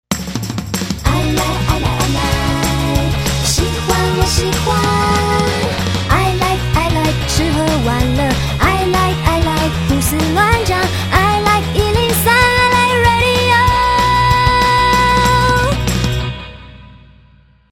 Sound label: ska Jingle